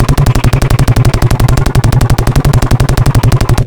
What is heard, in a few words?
gunship fire loop; hl2; combine gunship